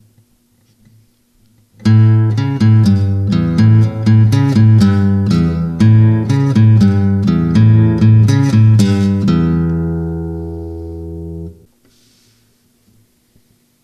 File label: MODE, guitar